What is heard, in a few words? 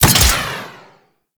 Ball Super Throw; Warframe; Tenno Ball; Missions